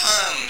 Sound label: other Turn; HeavenStudio; space Dance